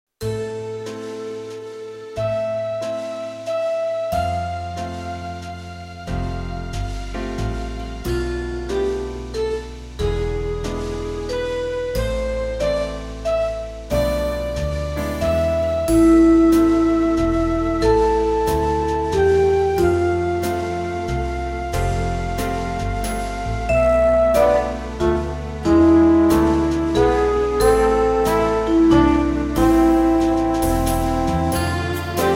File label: swing waltz